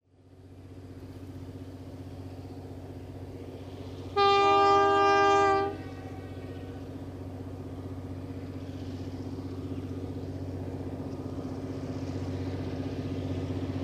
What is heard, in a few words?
North Mackay, diesels